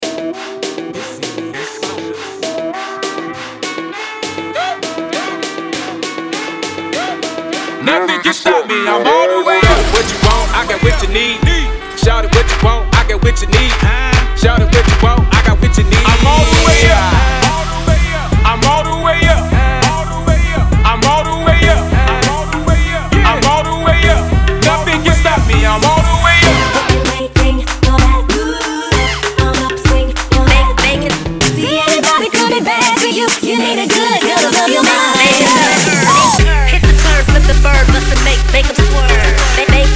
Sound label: Hip Hop Mix